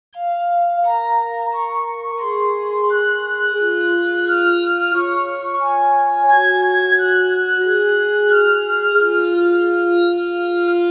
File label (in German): glasorgel